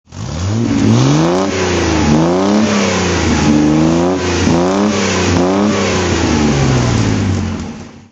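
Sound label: healey 100 6 throttle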